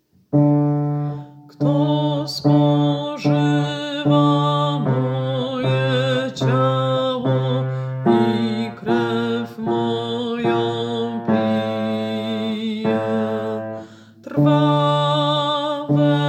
Kto spozywa moje Cialo bas

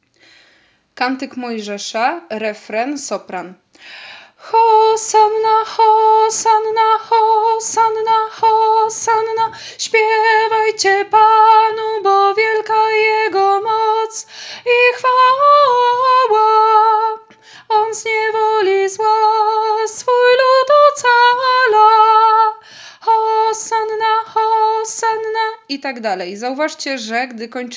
Kantyk Mojzesza Sopran refren; Wielki Post; psalm po 3 czytaniu wigilia paschalna

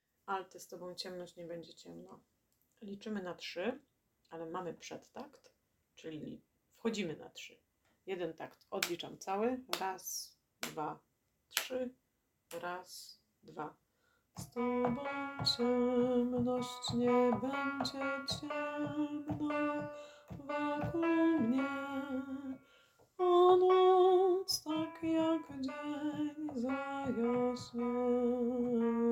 Z Toba ciemnosc alt; Taize

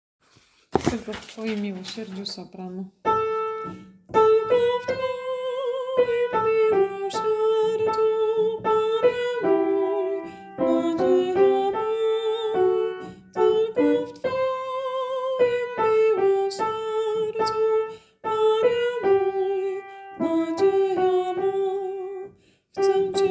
sopran; Tylko w Twoim milosierdziu

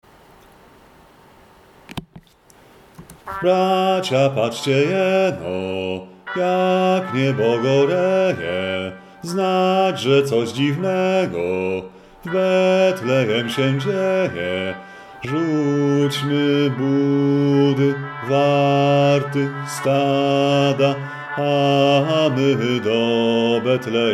BAS; Bracia patrzcie jeno; Boze Narodzenie

